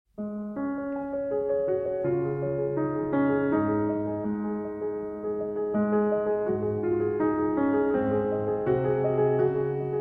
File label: Piano; Arr. For Piano From Violin Concerto, RV 297, Winter II. Lar